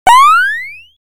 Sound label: warning